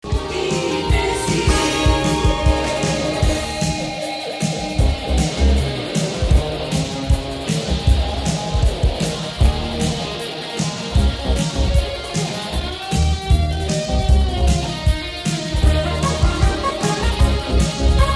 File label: Strat tapping